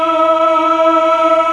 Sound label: MELLO CHOIR